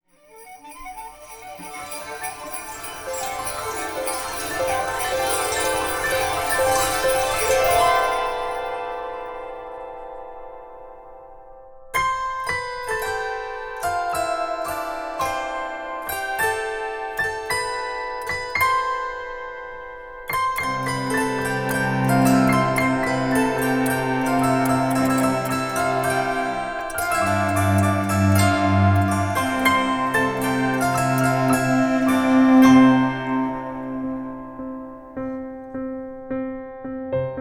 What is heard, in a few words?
Joy to the World, Classical